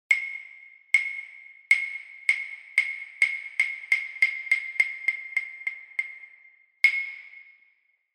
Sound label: Hyoshigi